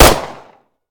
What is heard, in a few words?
fire, glock; arccw ud